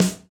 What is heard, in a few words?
snare, snare house